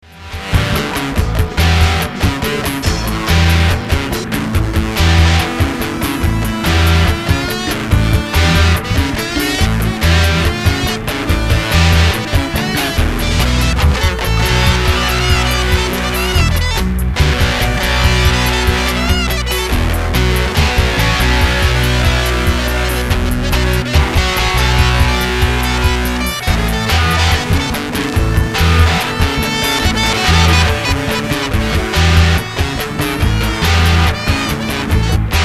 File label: reverse, guitar